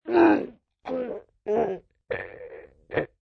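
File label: Gag, Gagged male attempting to speak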